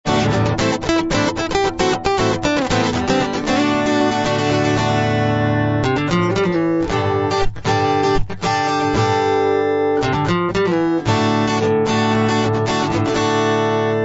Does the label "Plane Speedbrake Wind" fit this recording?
No